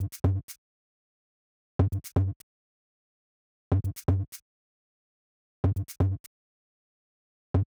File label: Tom shaker, 125bpm, Nu Disco; Zero-G; Construction Kits; Speedy